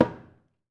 KUFI, Ritual Drums; E-MU Producer Series Vol. 7 – Old World Instruments

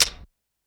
Clap Perc Drag; Lost Tapes From Mars; Clap & Rim

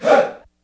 "HEY", Heart of Africa, shout

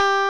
Electric Pianos

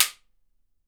WHIP; Miroslav Vitous - Percussion & Harp

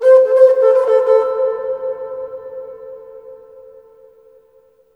HRNPHR, Heart of Africa; AMB. HRN FX

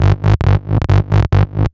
bass 138 g#, bass-licks, Techno; instrument-loops

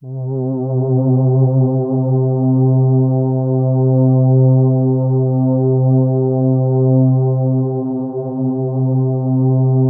C4, Synth Leads

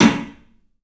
CLUB X STICK; 3D Sound; 3D Drums

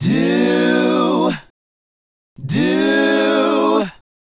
Male Group, Hip-Hop and RnB Vocals

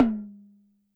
Tom Lo-Fi, YAMAHA RM1X

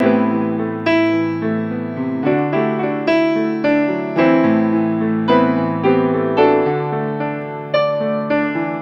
A Piano; Sonic Foundry (Sony Creative Software); Ugly Remnants VOL-2

